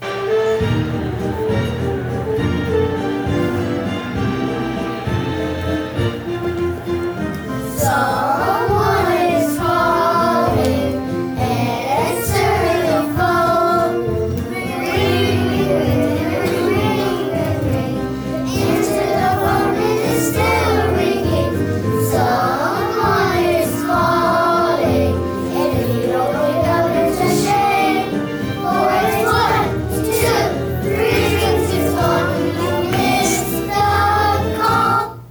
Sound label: ringtone